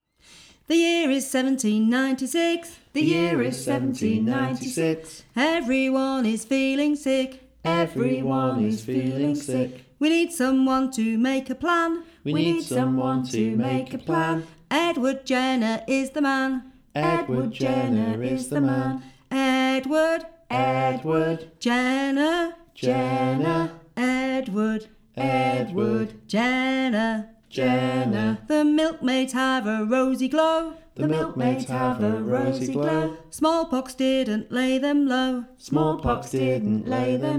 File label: chant; Edward Jenner; using music and dance to help children make healthy choices